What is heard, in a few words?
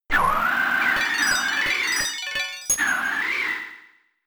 Blizzard